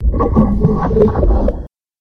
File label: monster stingray